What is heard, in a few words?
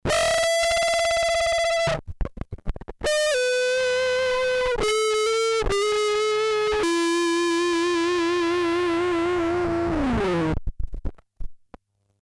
warp633 guitar; modular